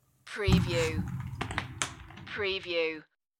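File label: Single Footstep Creak Wood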